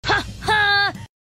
haha; killua

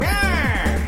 heyyeyaaeyaaaeyaeyaa

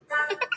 monster cute giggle

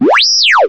hurt; r2 d2; lego star wars

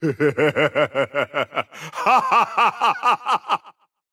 hehe haha

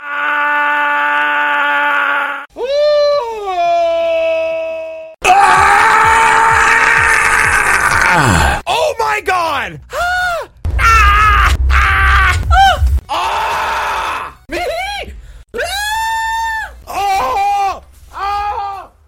screaming; twitch

